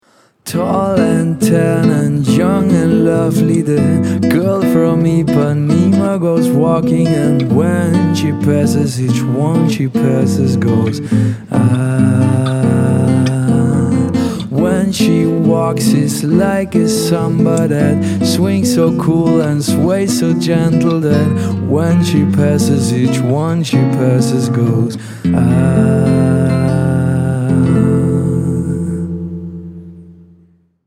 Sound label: Bossa Nova